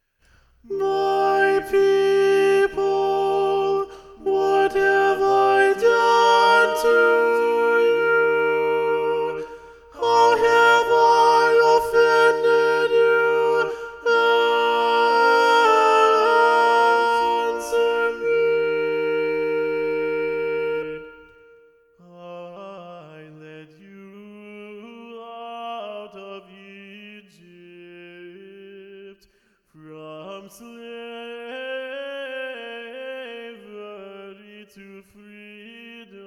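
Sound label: The Reproaches Part 1 SATB Soprano Predominant, Rehearsal Tracks; Tomas Luis de Victoria; ed. Geoffrey Cox; choirtracks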